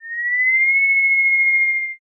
kettle

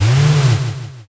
servo

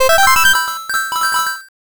jackpot

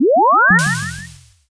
warp jump

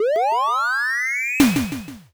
defib

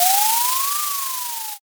drift

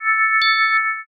save point

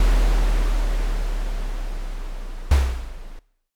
drawbridge

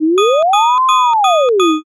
firetruck

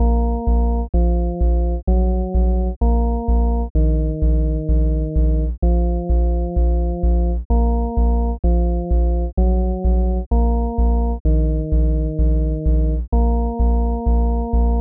bgm

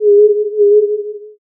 owl

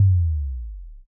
kick

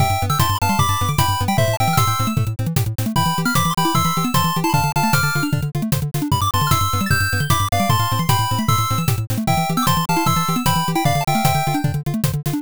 bgm drive

